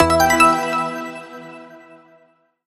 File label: multiline win; Magic Stars 5; Wazdan